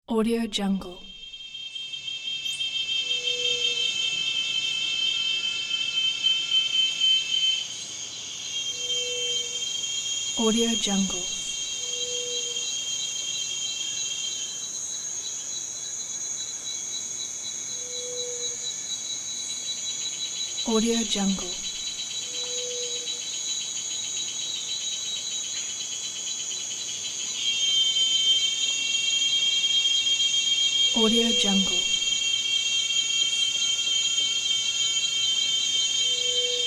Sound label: jungle